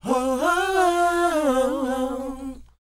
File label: WHOA C, Vocal R&B & Dance; ILIO; Vocal Planet